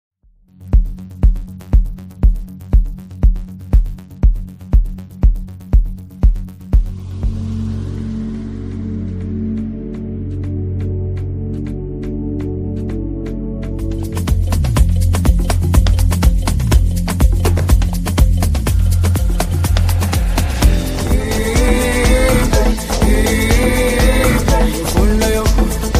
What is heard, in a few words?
afro house